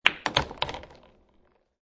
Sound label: Billiard Pool Snooker Ball Hits Other Ball Which Falls In Pocket; Pool Sound Effects